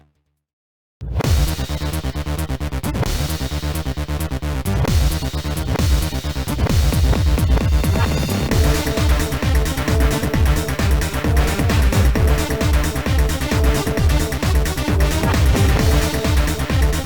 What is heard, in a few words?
Turbo Duel Music; YuGiOh Over the Nexus